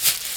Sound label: leaves footstep; everness